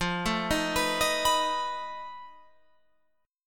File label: F 7th Flat 5th F down Guitar Open F; F7b5; arpeggiated